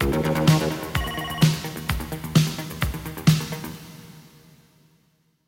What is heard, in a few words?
music